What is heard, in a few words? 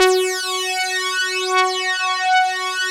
DS8 F#3; Korg; Back In Time Records; Korg Universe VOL-1